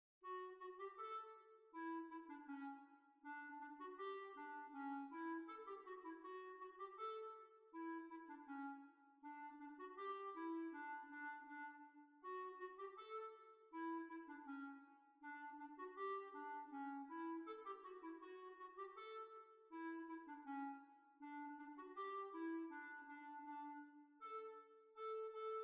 Hornpipe

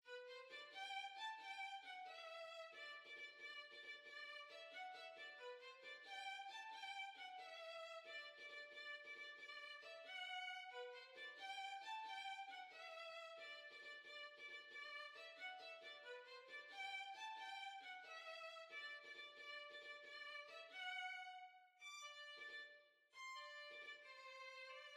Violin

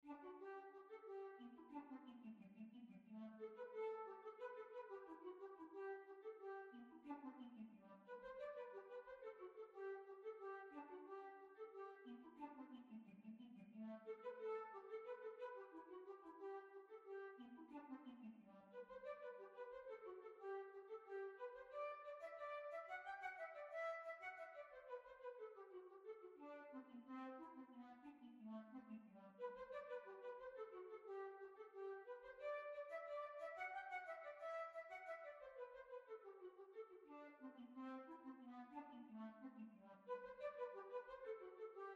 Hornpipe; Portobellow Hornpipe

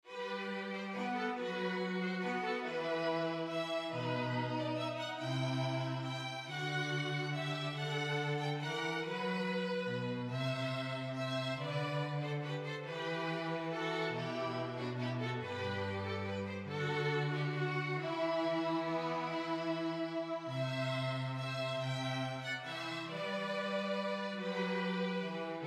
Boda Waltz, big band

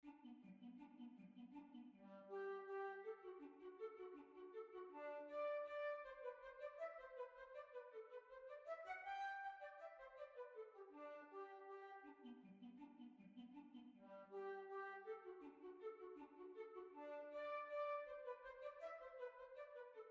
Hornpipe

